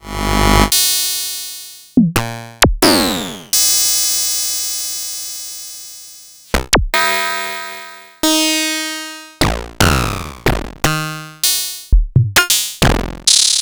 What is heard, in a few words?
Korg Monopoly, Samples Sliced; m8